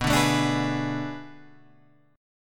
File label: B Minor 13th B down Guitar Open G